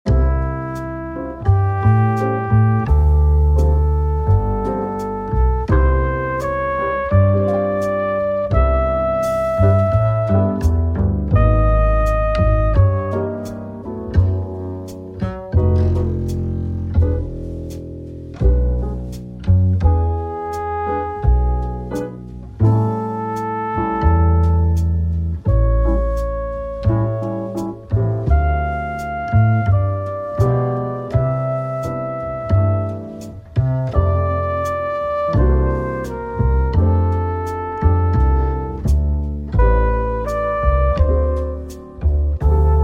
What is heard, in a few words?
Cocktail Piano Music